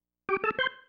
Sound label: Single Riff, Organ; Loops; Single Riffs; Sonic Foundry (Sony Creative Software); Joe Vitale Organ Donor